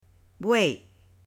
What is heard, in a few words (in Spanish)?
BUEY; ANIMALES